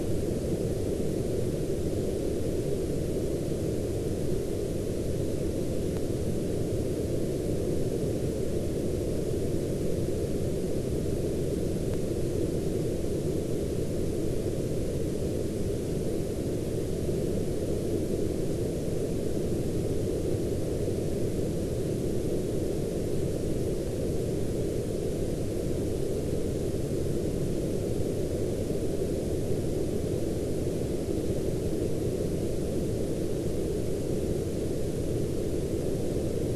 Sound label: WFR audio emfisis